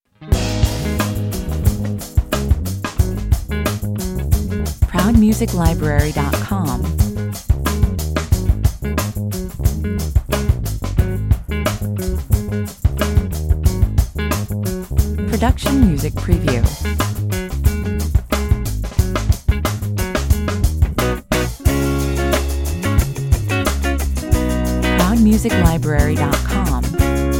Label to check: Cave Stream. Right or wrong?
Wrong